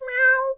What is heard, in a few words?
cat; TamTamMicro